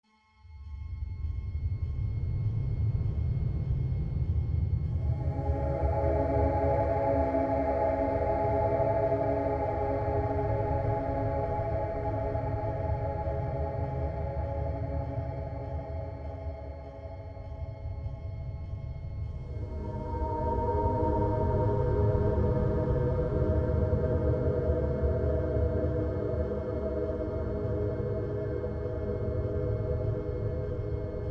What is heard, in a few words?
ambient